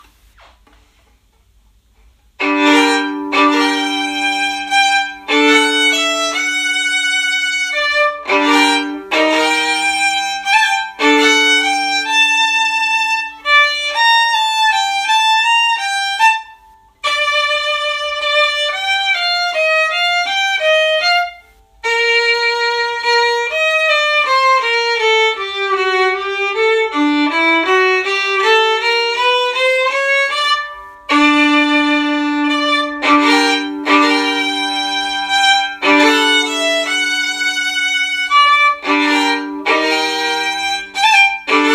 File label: Sarabande 1st Section; christmas concert practice clips